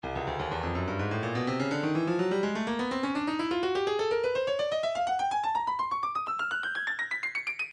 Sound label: breakbeat midi files 125 129 bpm 125; Pound For Pound E; Stylus Breakbeat Midi Files